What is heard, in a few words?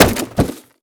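destroy cardboard small; Call of Duty Modern Warfare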